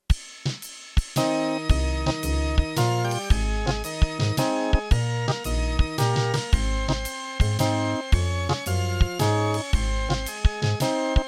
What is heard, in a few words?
To God be the glory